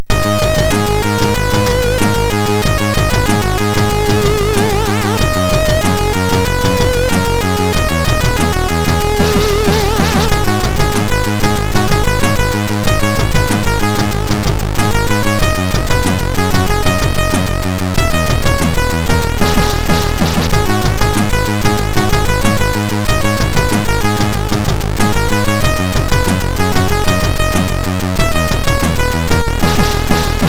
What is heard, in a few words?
MOS6581; Song Made in Monitor; MOS6581R4